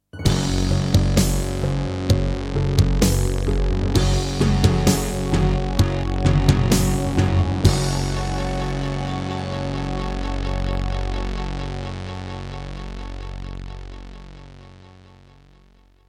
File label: Introduction, Serdaco Dreamblaster S1; Doom (Sigil)